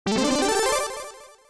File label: coin